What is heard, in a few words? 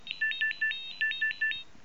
nokia3510i rush hour ringtone